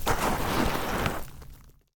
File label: sliding